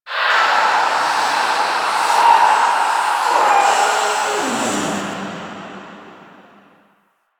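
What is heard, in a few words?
Infested Distant; Warframe; NineteenNinetyNine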